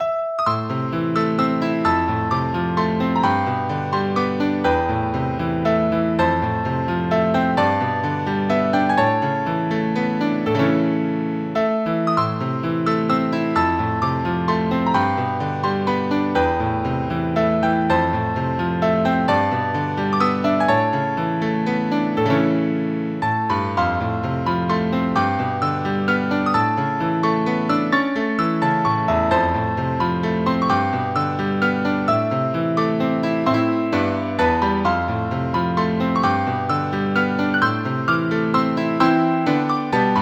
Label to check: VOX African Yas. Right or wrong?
Wrong